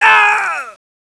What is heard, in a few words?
pl death, player; bts rc